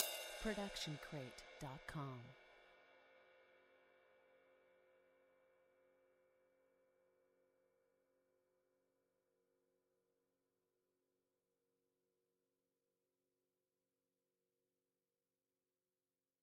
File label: ride cymbal 20 inch; soundscrate